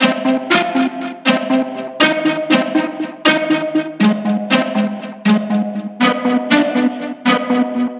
Melodic C 120; With You

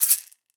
shaker

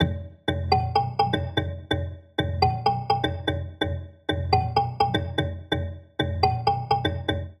126 F Perc Lead Synth

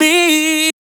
Vocal One Shot Male D-

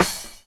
Perc

